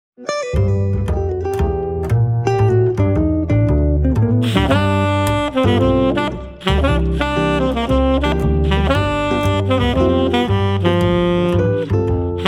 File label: Jazz instrumental trio Blues